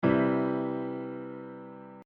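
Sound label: C Major Root Position Triads; Triads and Pseudo-Triads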